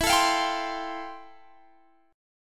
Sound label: F Major Add 9th E; strummed